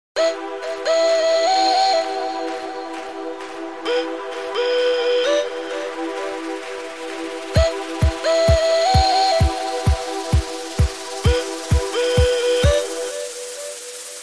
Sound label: Stylish Sms Tone